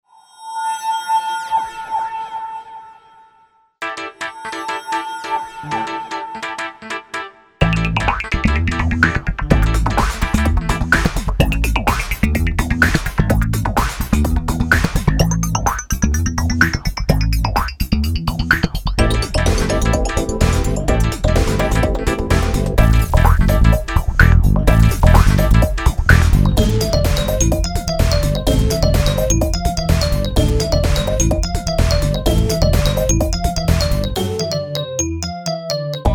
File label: electronica